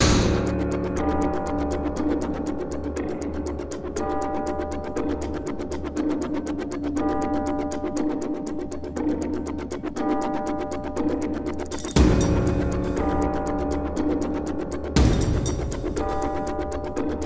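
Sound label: music, ambient fx percussion loop; transformers war for cybertron gamerip; ACTIVATE TRYP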